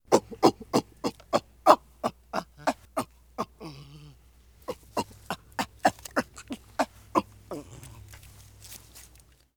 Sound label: Ape Grunts Breaths